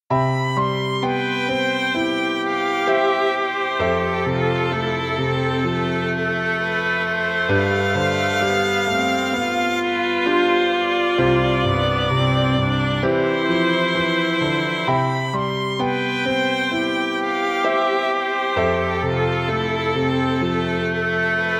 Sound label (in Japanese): futatsu no violin